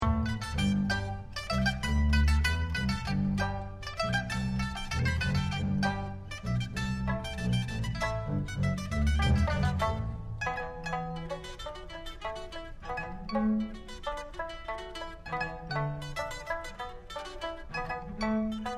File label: Hoedown